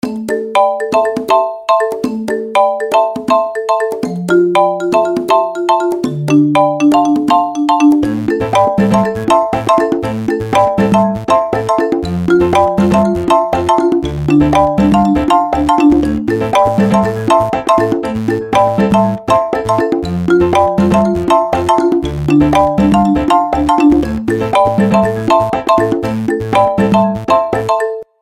Happy Marimba, ringtones; Tecno Spark Go 2023

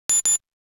Beep Zoom In; SKY; IM-S500K; Camera; Control Sound; System Sounds

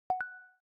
Silent mode off; Samsung; Galaxy Z Fold8 Ultra; ui

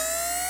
Camera Zoom In Short; Samsung SGH-F300

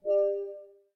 Low Battery

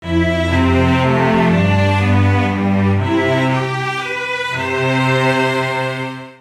Tour String; ZTE Nubia V70 Max

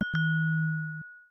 Wine Glass Toast; Samsung Galaxy S25 Ultra

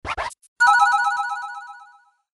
Raid, notifications

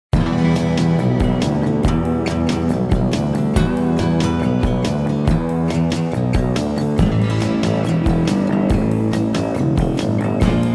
Desert Wind, Nokia Tones; Windows Phone apps

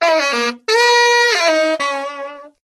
Reed; Sony Xperia Z3

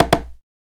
Knock, notification; Xiaomi Black Shark

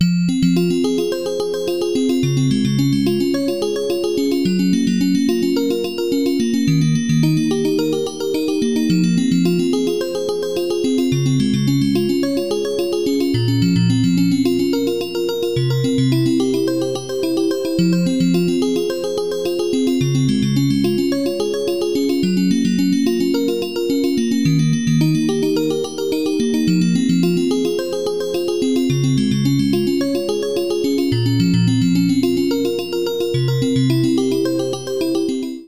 Bell; Lenovo; P2

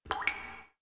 Clr, Keytone sounds, Tada; LG

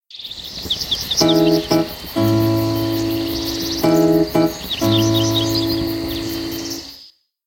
Campfire, ringtones; WindCanada

